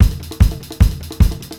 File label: BEAT, Roots Disco; USB Soundscan vol.32; AKAI